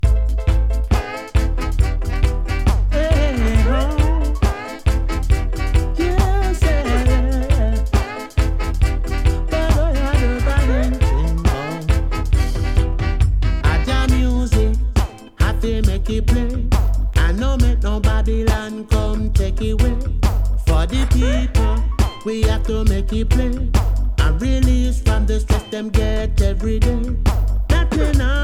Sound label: reggae music